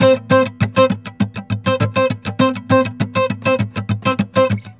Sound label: GUITAR SAMBA; Best Service ProSamples vol.14; AKAI; World Grooves